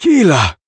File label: Death; Eridan